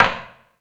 Percussion FX, E-MU Pro-Cussion; E-MU Pro-Cussion-1

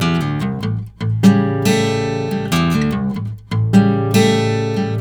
BARI RIFF, Blues Guitar Samples

